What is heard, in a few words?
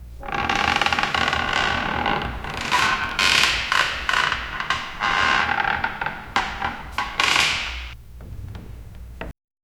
faint rope and rigging; theatre